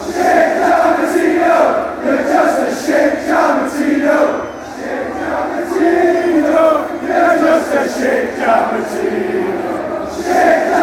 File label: fanchants, peru